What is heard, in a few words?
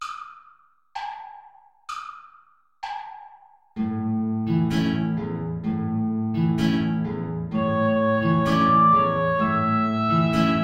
tango play along without oboe; albeniz isaac